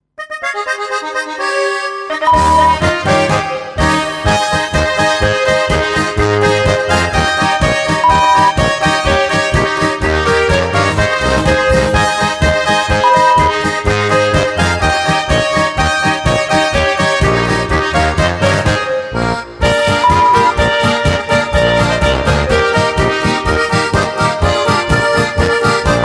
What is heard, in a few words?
Oberkrainer Polka 125; Volksmusik, alpenländische, bayerische Musik, Zillertal-Sound, Oberkrainer; Zither